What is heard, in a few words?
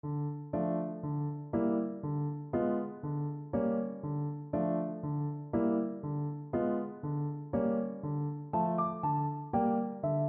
Bass Clarinet